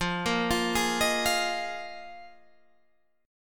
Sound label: Bb 7th Suspended 4th F